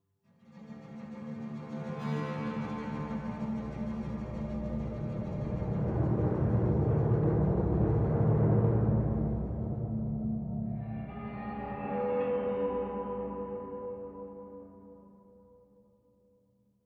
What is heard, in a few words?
Spooky Ship Mood